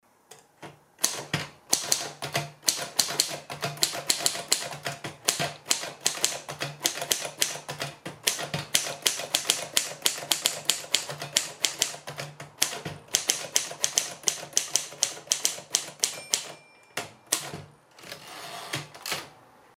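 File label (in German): triumph gabriele, Schreibmaschine; Hörbeispiel Triumph Gabriele; Alte Dame in neuem Kleid – Triumph Gabriele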